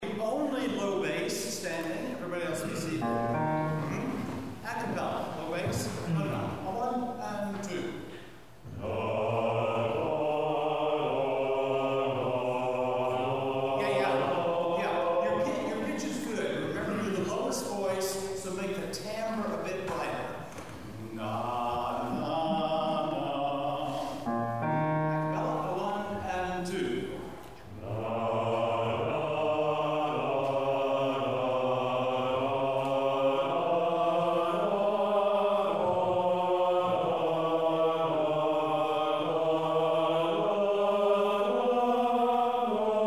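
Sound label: Pilgrims Chorus learning, rehearsal files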